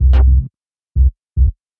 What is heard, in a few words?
110 Fm Chord Morph, Melodic Loops; Electronisounds